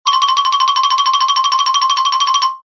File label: xylo medium trill